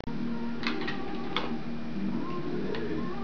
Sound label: Quantum Oscillator; BrotherDavid